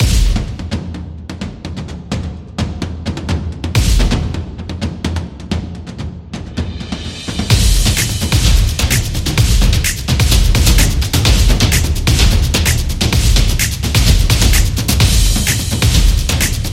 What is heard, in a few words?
Dance, epic drum